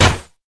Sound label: bow loose; items; Minecraft; Remastered